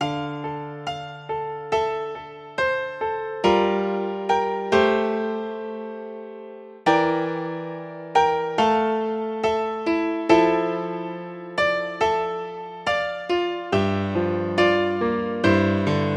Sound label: Kawai Ex Pro